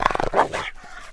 Rottweiler Growl Har

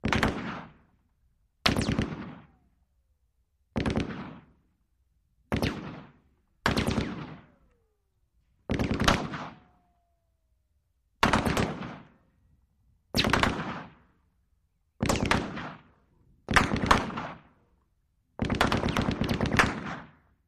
AK47 Longs Bursts Imp

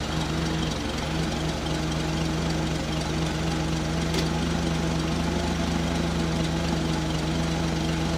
Forklift Idl Looping

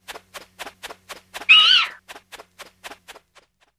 Single Bat Wing Flap; wings

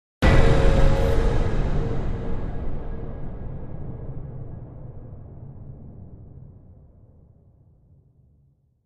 Impact Metallic Thum

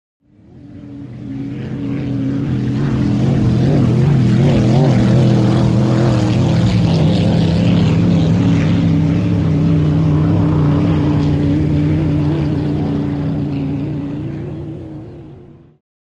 Power Boats By Fast C, off shore power boat

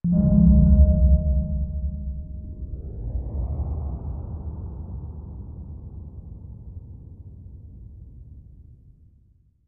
Bass Impact Tonal So; impacts

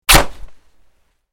Bullet Tears Vest

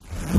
zipper; zippers

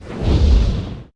Dragon Fire Whooshes; No Crackle Element X 10

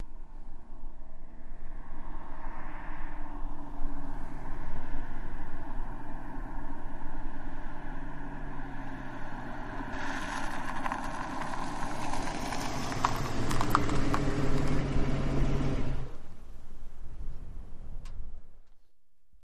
Mustang Stp Off Shrt I

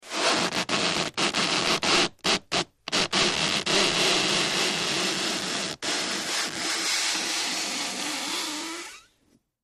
Rubber Stretching Sq, rubber friction

